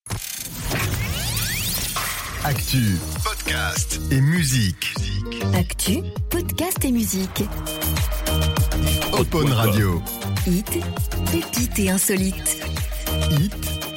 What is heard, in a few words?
Radio Jingles; Opon